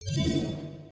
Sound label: new item; aronwk collection; ndaudio